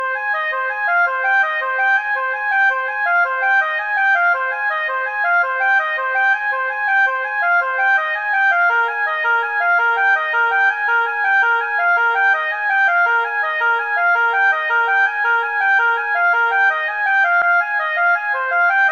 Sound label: The Poplars Jig